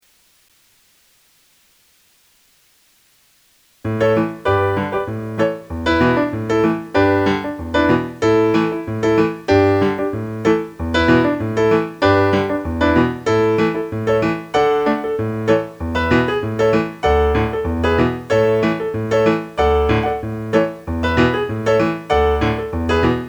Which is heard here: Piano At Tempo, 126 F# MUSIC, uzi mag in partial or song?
Piano At Tempo